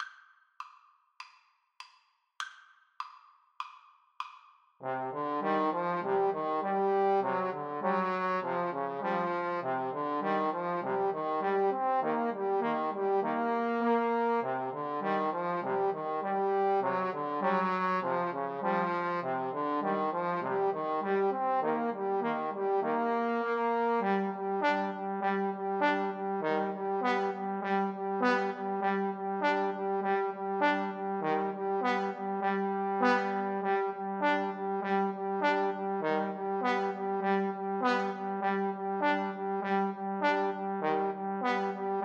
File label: mountain king, Trombone Trio